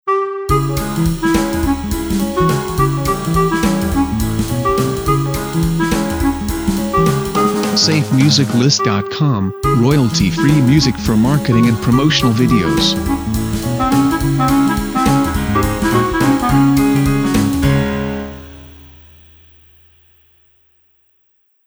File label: Dynamic Jazz Video Intro; SafeMusicList